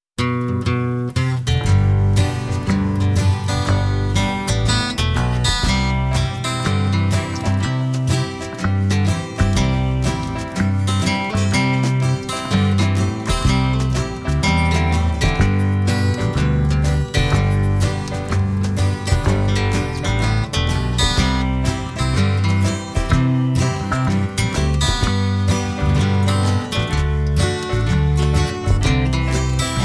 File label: Ripple Tune